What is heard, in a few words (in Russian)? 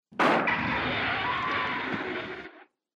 shina lopnula na doroge